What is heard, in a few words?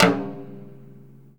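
Japanese PERCussion; USB Soundscan vol.60; Asian Traditions; AKAI; JAPAN PRC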